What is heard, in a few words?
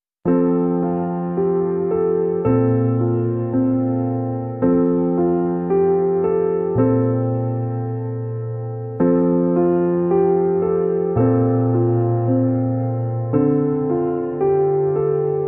Piano Only